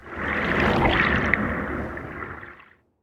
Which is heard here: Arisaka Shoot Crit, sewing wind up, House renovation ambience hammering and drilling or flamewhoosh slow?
flamewhoosh slow